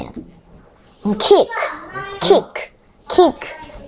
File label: kick